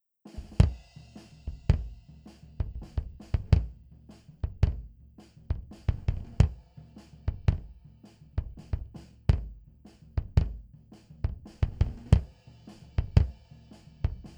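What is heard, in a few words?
bass drum, drums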